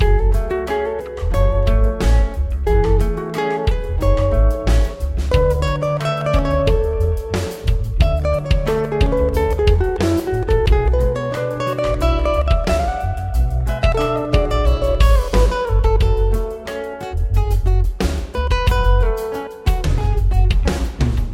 zatsiklennye melodii dlia gitary loop

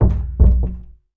zvuki bochki

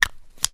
zvuki gubnoi pomady

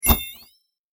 zvuki volshebnoi fei

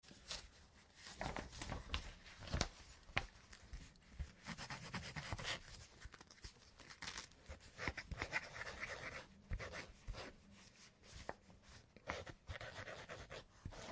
zvuki perevoi ruchki i chernilnitsy

